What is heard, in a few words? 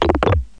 backpack use